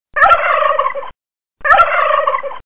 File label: gobble alt